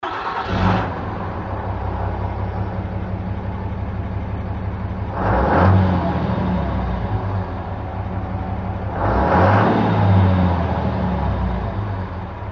magnaflow xl series diesel exhaust system moderate to aggressive sound